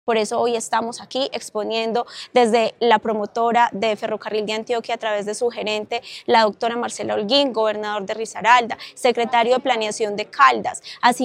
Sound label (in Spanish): Rap